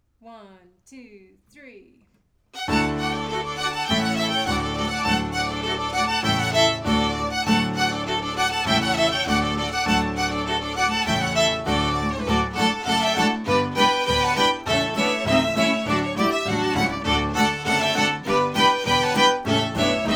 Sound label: Ronfleuse Gobeil all parts